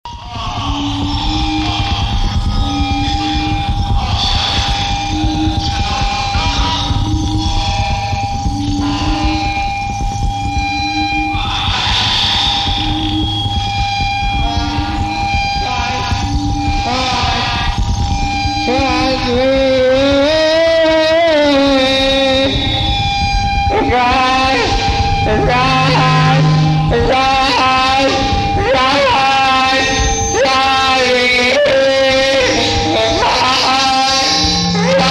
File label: horn voice; sub cancer; Kegelerstübchen 1987